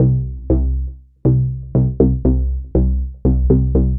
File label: Matrix Bass, Bass Foundations; Sonic Foundry (Sony Creative Software); The Electronic Music Manuscript Collection